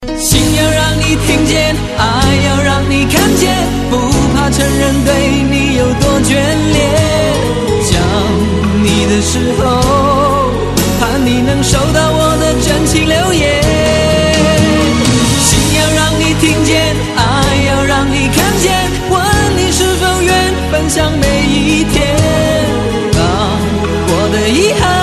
Chinese Song

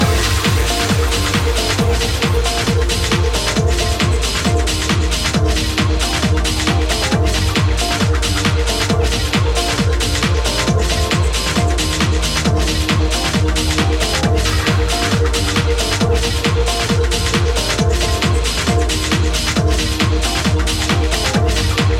Atmos Style Tekno

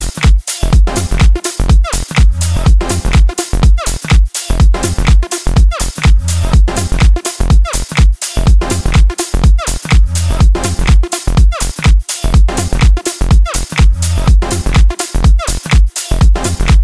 Electro Mix

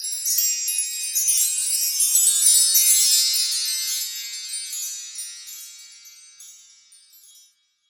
Windchimes

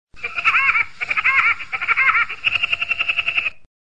Woody Woodpecker

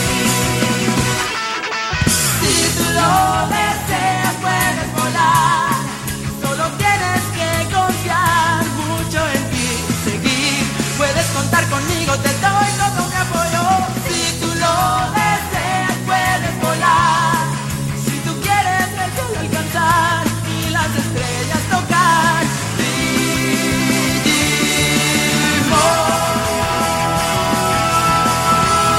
Ringtone; Digimon 1

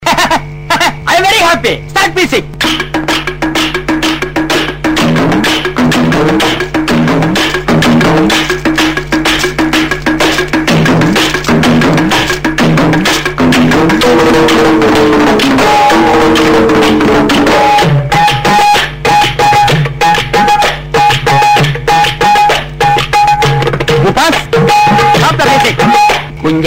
Start Music

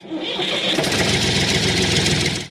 Tractor Sound

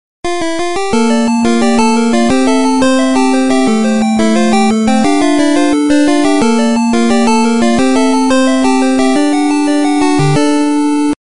8bit; Madoka Magica

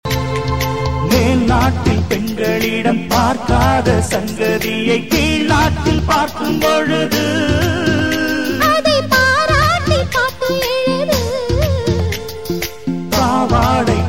Tamil Girls

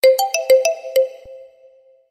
Bellz

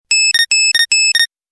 Cool Sms Tone

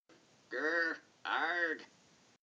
Sms

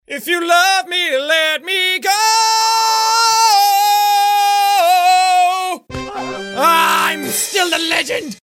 Sings

